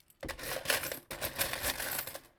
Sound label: coin; 缶からお金を出す音